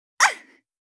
VOX Get Hit; Li Li; Heroes of the Storm; warcraft

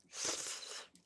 slurp; Lewd SFX

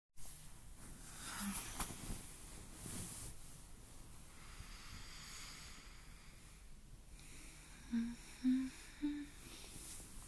soft voice, sleepy yawns, lullaby; F4A; Blissful moments of gratitude; fluff brain; mindfulness; acceptance

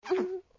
Suck; Lewd SFX

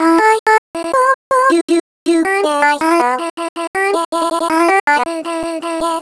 Vox Glich

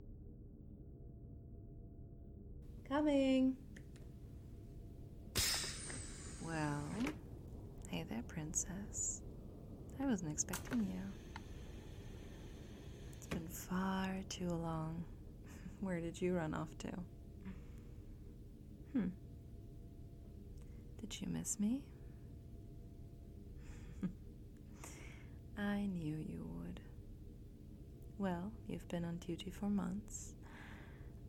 Spaceship Noise; The Pleasure Planet

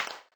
Sex Slide

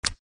Slide; Lewd SFX

